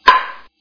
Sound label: Home Run; TIPro